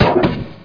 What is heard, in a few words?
button, buttons; Hexen2